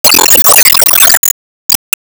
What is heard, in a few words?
Sp Mega flare Burst; Se Sp Megaflare Burst